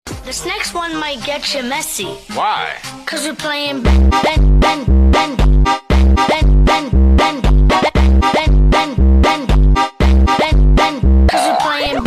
phonk; bendy

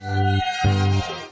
tension sting

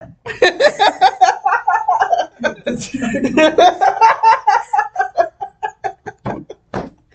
laugh

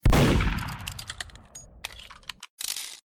shooting fire

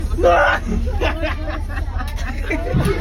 laugh

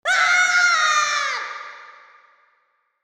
yell; link

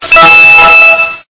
windows 7 earrape

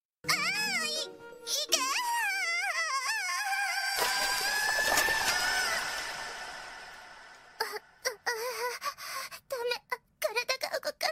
cool anime sound

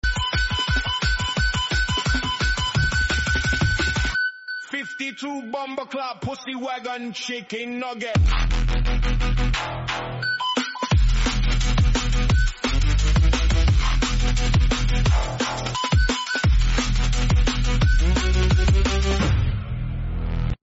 chicken remix

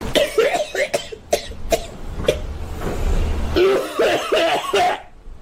choke cough

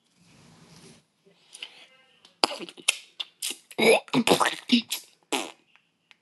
fart collapse

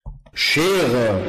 schereeeee

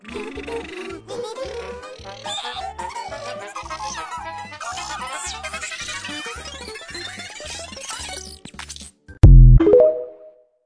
super super high pitched

